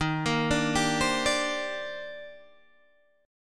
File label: D sharp Major 13th D sharp Guitar DADGAD, chord